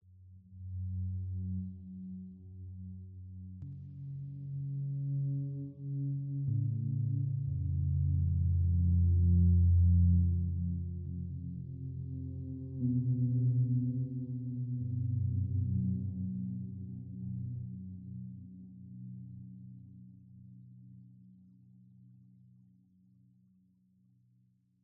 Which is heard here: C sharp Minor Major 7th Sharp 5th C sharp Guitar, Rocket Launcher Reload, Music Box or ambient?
ambient